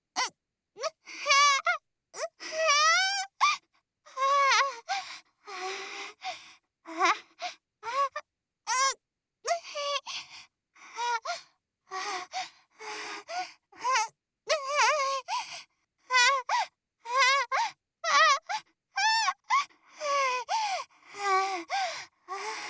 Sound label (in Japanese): 喘ぎ（SEなし）喘息